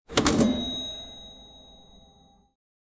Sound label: cash register